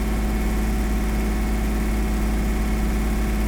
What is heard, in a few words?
CAR ENGINE i6 3L 2000 RPM loop mono; adams venture origins